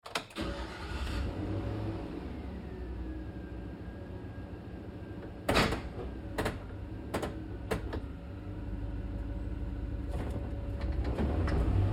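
Truck GMC Savana interior Drive Accel Decel Medium; 2010 GMC Savana; Trucks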